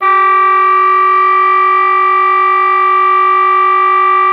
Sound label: OBOE3; Xsample VOL-6